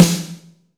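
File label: wet snare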